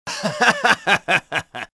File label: Laughing; suoniFX